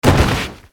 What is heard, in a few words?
down; basalisk